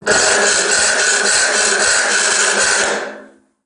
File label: saw; industrial